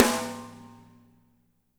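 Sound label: snare left; Spectrasonics; Burning Grooves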